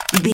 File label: gun submachine auto magazine load